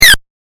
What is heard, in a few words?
game effect